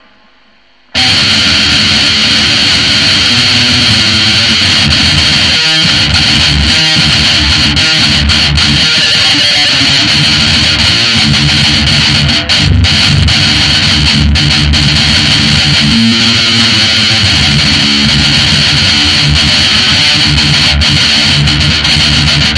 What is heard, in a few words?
grindcore guitar